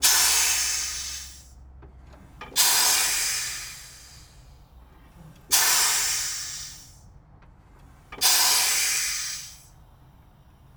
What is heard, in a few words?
spitfire mk ix air release brakes; 1943; Pole Position; Supermarine Spitfire Mk IX